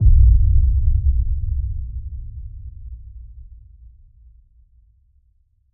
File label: Echo Soft Boom